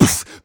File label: Beatbox Snare, Human Beatbox